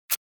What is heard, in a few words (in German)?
Streichholz